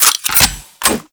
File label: Rifle Reload; Grn Kuva Lich Rifle